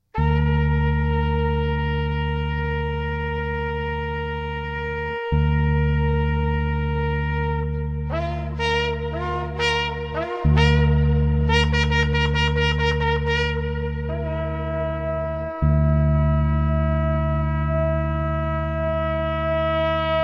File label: shofar; proclaims messiah